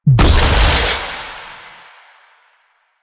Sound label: Charged Shot, Sidearm; Weapons; Warframe; Tenno; Wraith Sidearm; Epitaph Prime